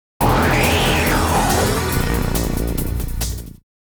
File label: Lose A Battle Jingle Lose; carnage heart portable original sound track